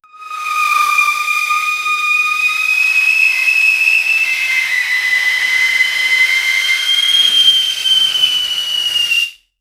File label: shrinking like glissandos, whoosh